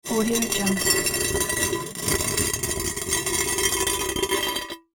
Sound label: ceramic tile slide on concrete; sound ideas